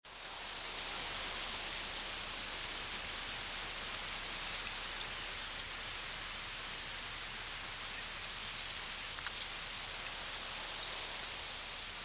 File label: leaf